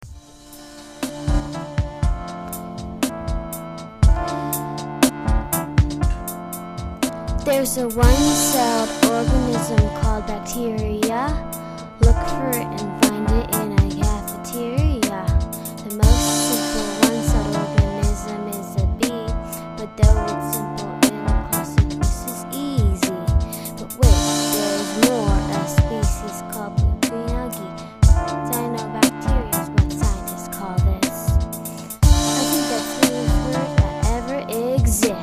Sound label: Bacteria rap